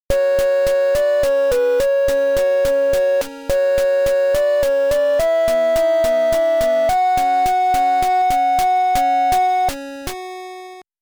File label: Victory (Stage Clear); Ikari Warriors Trilogy; NES